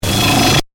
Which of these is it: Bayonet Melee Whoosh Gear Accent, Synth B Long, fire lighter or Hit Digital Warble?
Hit Digital Warble